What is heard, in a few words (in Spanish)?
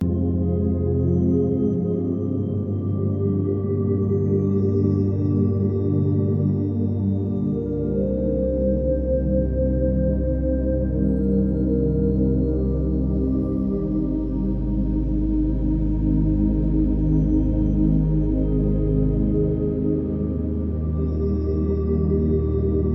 Sonidos de Pianos